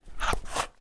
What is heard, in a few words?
dog med playful bite air